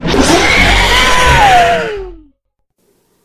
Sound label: jumpscare sound